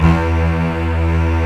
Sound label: STRINGS E2; AKAI S-Series CD-ROM Sound Library VOL-2